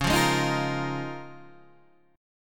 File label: Eb 7th Suspended 2nd Suspended 4th Db